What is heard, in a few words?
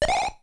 tail attack; super mario bros 3 nes